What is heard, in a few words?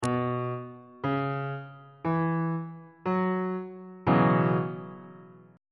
g flat dominant 7th suspended 4th 1st inversion on bass clef